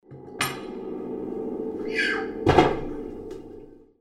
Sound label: Pianotron